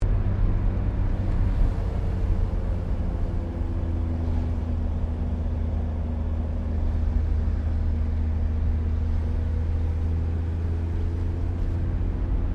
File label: CAR HOLDEN FJ DECELARATE THROUGH GEARS, Classic Australian cars; Holden FJ 1955; Car Sound Effects; Cars (Sound Effects:Vehicles)